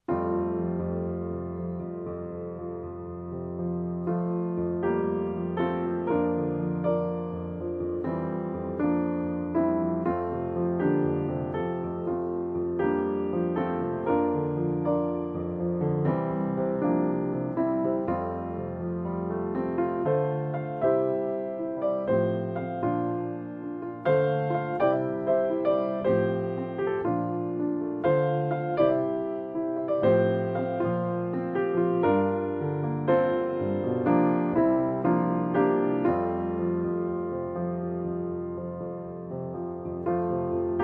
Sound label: Solo Piano